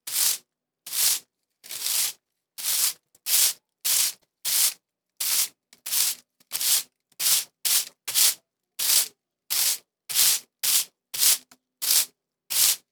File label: sweeping the sidewalk